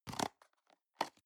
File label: plastic box food small close medium